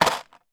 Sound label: industrial plastic box of small screws drop on table